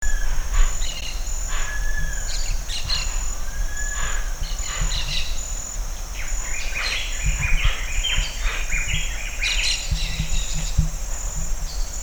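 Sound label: Hoatzin, bird; گندمرغ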